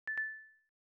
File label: new round